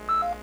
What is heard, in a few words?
sysbeep